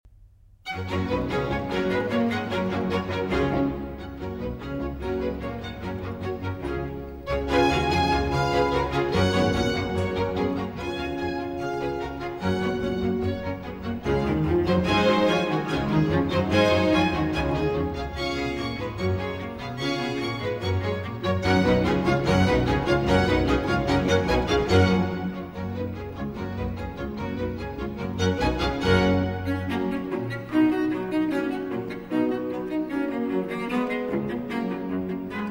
Cello Concerto in G Major; RV 414; Vivaldi; 1. Allegro molto

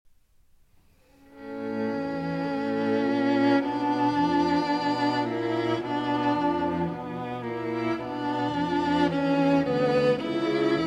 String Quartet No. 2 in A Minor; Op. 51, No. 2; Brahms

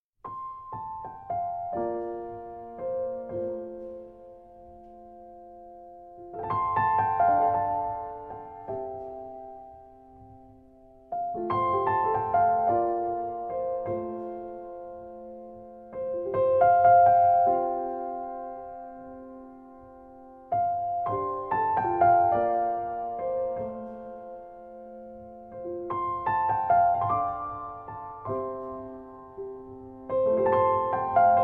Solo Piano

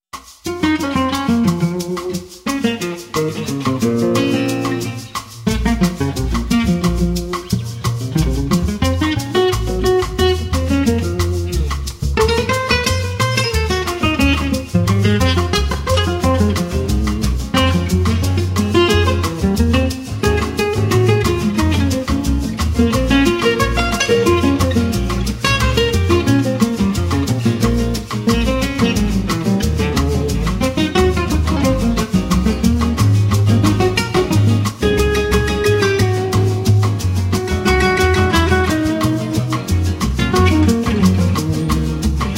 In B-Flat Minor, Arr. For Jazz Ensemble

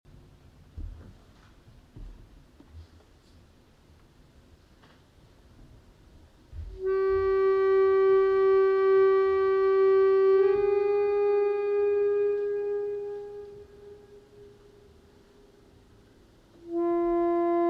Harmonium

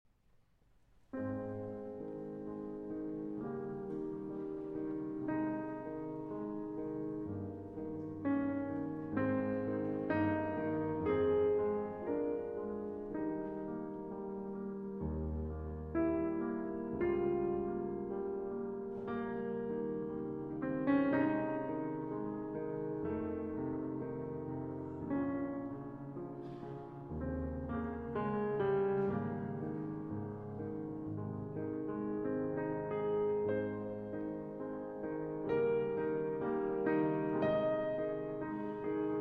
Piano Sonata No.8 in C Minor

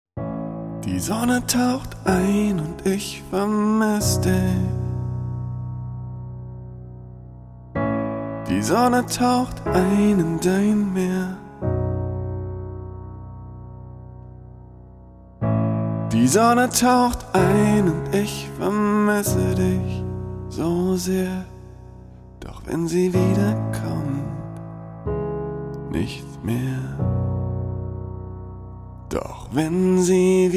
Orchestral

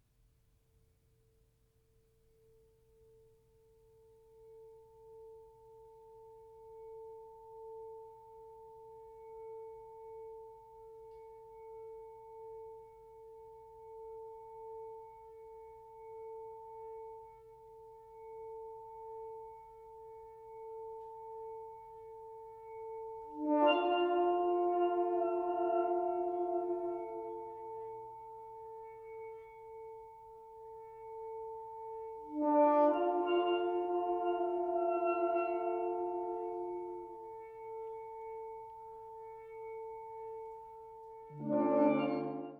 Deep Hymn

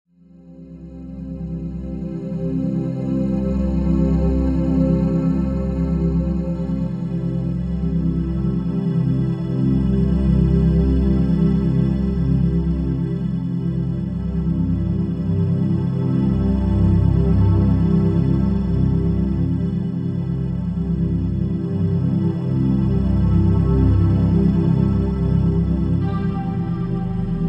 Cleanse Destructive Energy 768 Hz

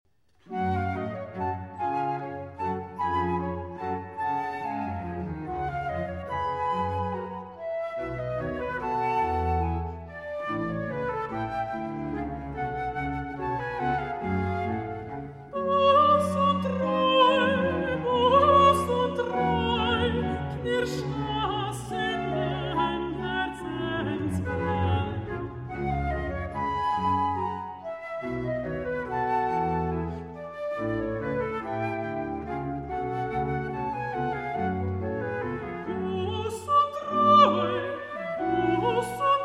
Aria (Alto); Matthäuspassion; Buss und Reu

